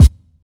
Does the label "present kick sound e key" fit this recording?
Yes